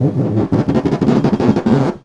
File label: Snare Drum; Percussion Samples